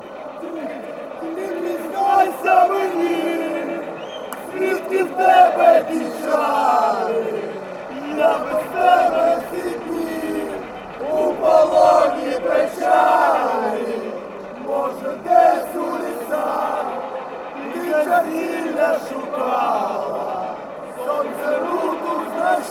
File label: metalist chant